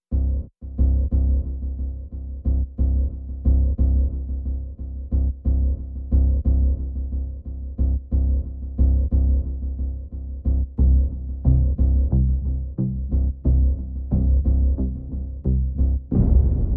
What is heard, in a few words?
Perc and Pulses